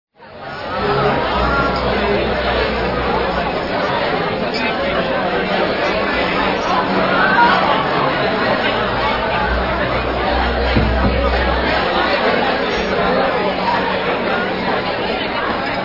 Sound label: Crowd Dinner Club